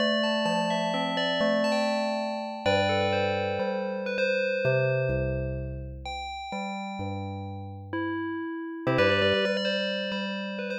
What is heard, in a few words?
cmaj